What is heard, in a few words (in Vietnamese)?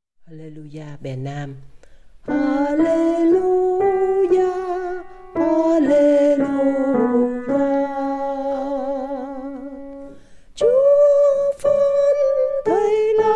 Alleluja nam